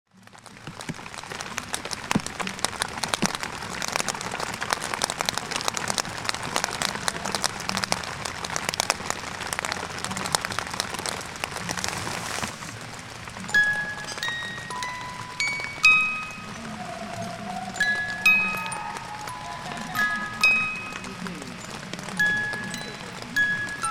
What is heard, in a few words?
swan lake droplets with thunder; Montana 2013